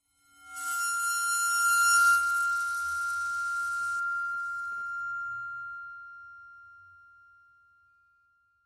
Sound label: Vibrate Bowl, bowed metal